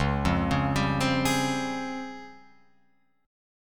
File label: Db Major Sharp 11th down Guitar Open F; arpeggiated; JGuitar